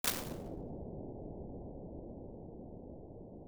Large Metallic Cylinder - long low frequency sustain